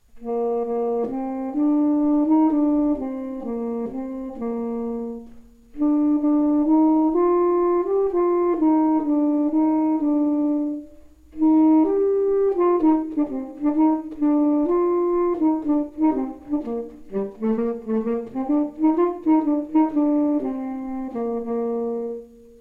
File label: tenor